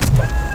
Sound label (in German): HYDRAU; Best Service; Advanced Mega Effects